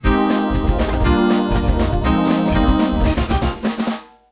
Pop

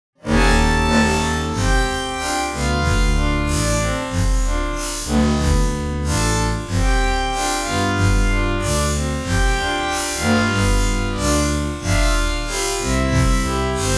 Jazz

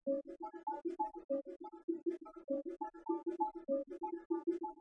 Bongo

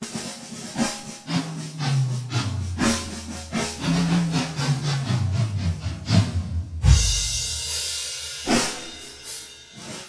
Drum Solo; TSMtoolbox

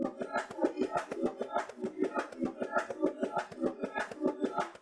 Bongo

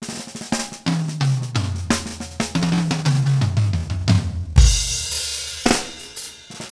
Drum Solo; WSOLA; TSMtoolbox

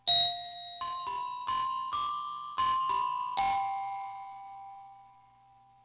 Glockenspiel; WSOLA; TSMtoolbox

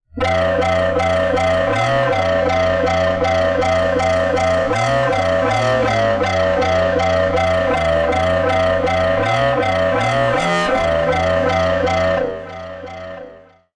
Synth Mono

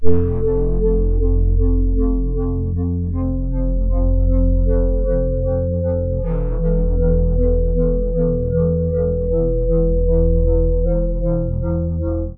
Synth Poly

